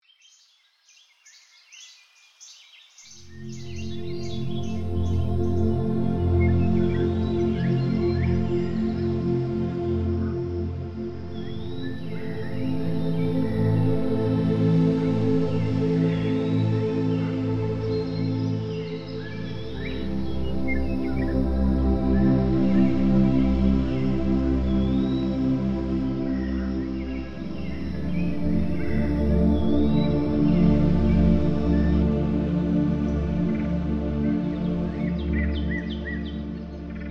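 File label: Music for Ketamin Session Theme 1 With Birds; musikk til psykedelika assistert psykoterapi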